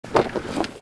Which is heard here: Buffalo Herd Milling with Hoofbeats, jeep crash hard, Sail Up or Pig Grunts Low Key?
Sail Up